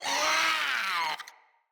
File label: Infested Runner Attack